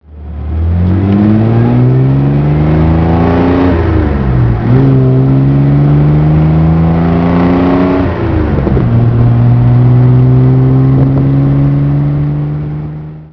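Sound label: Healey 100, classic car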